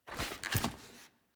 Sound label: Footstep Glass Rotate